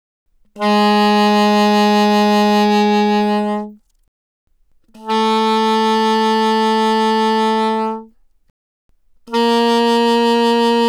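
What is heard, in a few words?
Soprano Sax Vibrato ff Ab3 B3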